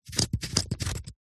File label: foley binoculars zoom dial turns